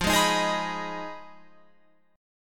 F Minor 7th Flat 5th, Guitar, Open F; Fm7b5; strummed

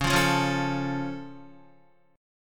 Db Augmented, down, Guitar; strummed; JGuitar

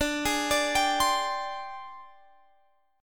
G sharp Major 7th Flat 5th

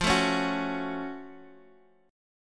F sharp Minor Major 7th F

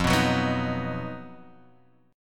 B Major F

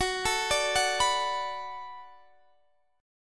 B Minor 7th F sharp, chord

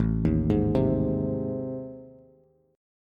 A Minor Major 7th Flat 5th, Bass; arpeggiated; AmM7b5; JGuitar

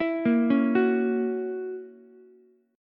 F sharp 7th, Ukulele; arpeggiated; JGuitar

